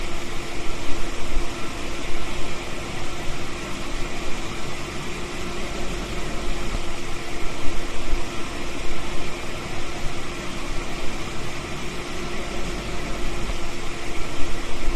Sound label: push; sokoban warehouse